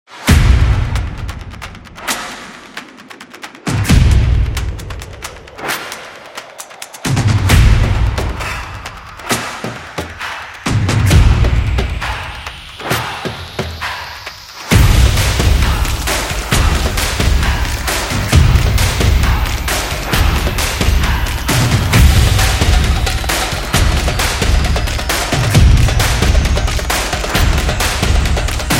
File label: Drums for Sports